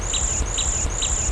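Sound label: C wax cricket, cedar waxwing cricket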